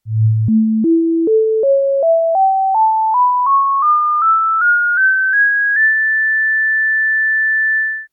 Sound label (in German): obertonreihe A110Hz auf